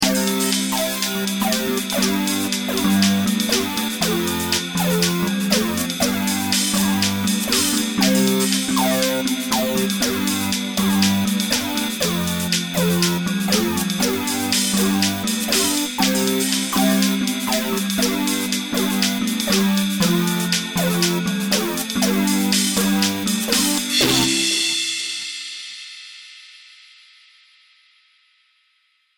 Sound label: electronic clav